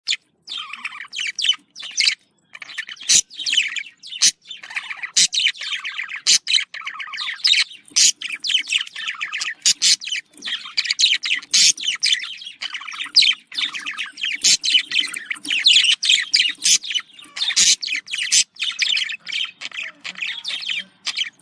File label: paired sound budgie